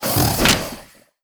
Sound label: win Croc